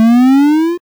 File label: Menu Startup